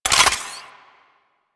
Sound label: Modular Secondary Handle Slow Reload, Guns; SU Modular Guns; Reloads